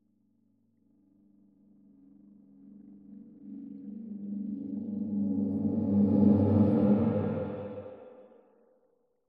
Crescendo Loop; horror and atmosphere fx; Silent Hill Downpour

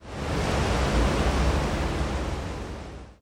Wind; Silent Hill Downpour

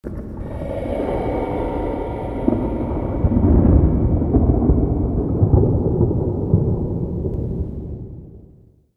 Cave Deep Slow Movement; horror and atmosphere fx; Silent Hill Downpour

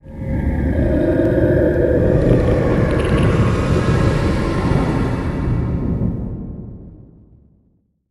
Cave Deep Slow Movement; horror and atmosphere fx; Silent Hill Downpour

